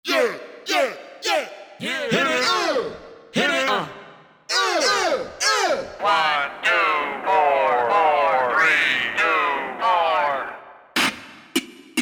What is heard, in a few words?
Techno Vox